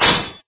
brosili tyazhelij zheleznij mech na metallicheskuyu poverhnost

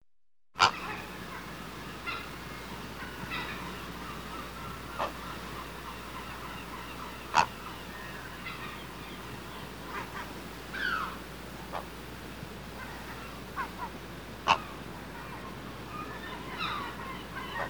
bolshaya golubaya caplya preduprezhdayushie kriki iz gnezda

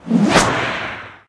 korotkij chyotkij yasnij bistrij vzmah mecha

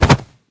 korotkij zahvat i rezkij brosok

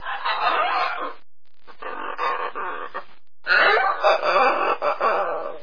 grubij skripyashij zvuk popugaya

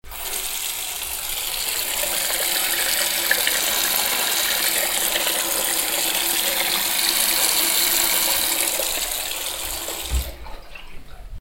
vodoprovod

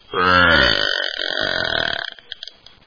toshnota prodolzhitelnaya

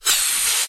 vozdushnii shar vzriv odinochnii korotkii priglushennii

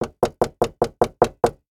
zhivoj zvuk ohotno stuchit v derevyannuyu dver

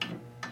gudenie vklyuchennogo ustrojstva

